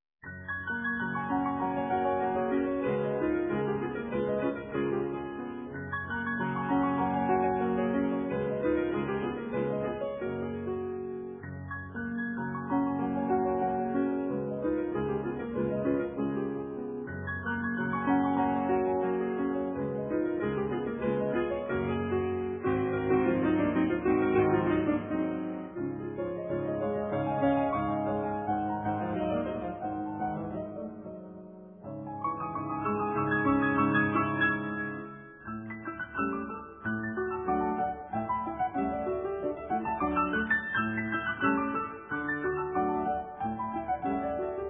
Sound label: PIANO RECITAL, Polka in F; JANACEK BEETHOVEN SMETANA; Bedrich Smetana; Three Salon Polkas Op 7; Polka in F sharp maj